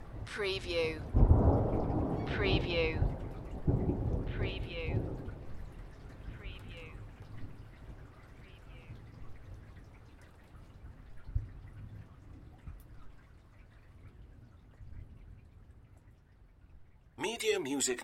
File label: Thunder Distant Thunderclap Long Rumble Tail